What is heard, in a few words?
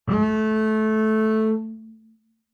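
Bass arco fortissimo sul D Ab2, Double Bass; MIS Pitches - 2014; Strings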